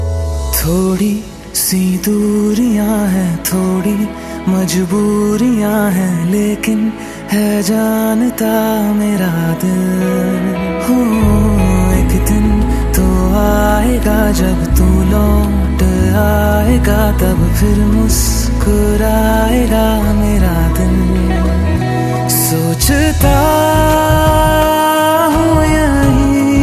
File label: Hindi Song Ringtone